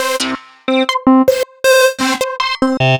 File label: Synth Hits